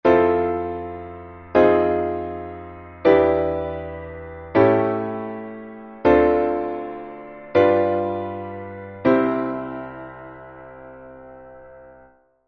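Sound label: chord progression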